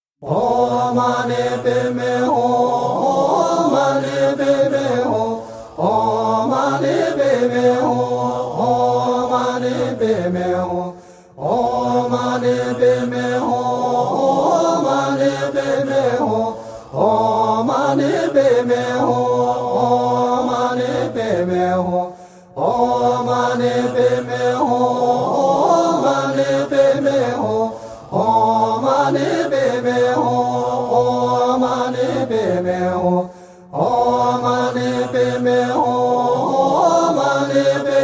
Than Chu Om Mani Padme Hum

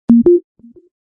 tin nhan oppo pad air 2 sms

